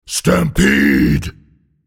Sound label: attack; Minotaur